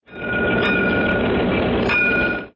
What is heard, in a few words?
light rain